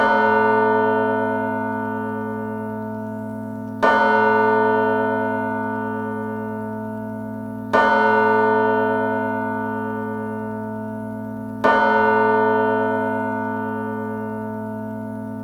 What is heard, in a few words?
Aerodynamic Gong; Klingelton